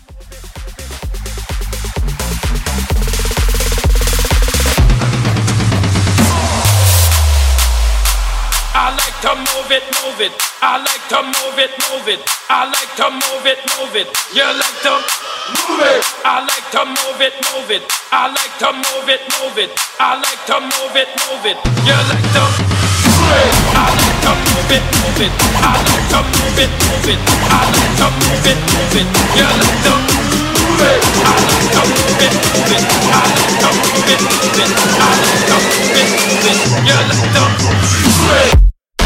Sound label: bootleg 128 clean